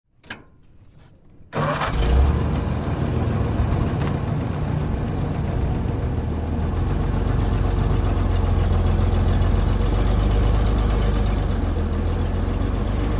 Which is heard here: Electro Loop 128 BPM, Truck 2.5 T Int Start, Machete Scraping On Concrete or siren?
Truck 2.5 T Int Start